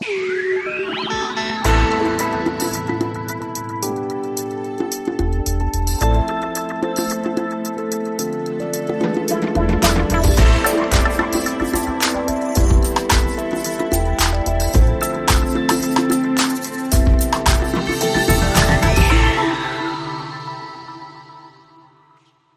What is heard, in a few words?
Ramp Build